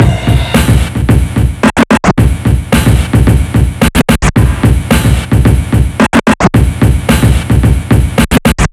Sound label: Night Rider C, electronica